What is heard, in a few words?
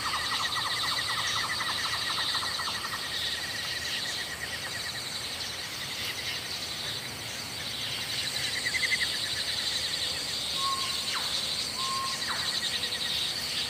Amb Human Night; Ladianes